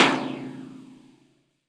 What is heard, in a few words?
Underpass, Impulse Response; Hopkins Drive; EchoThief Impulse Response Library